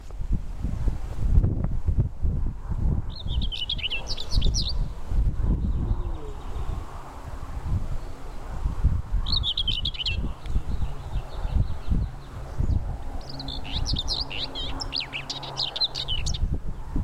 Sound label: Sylvia communis rubicola song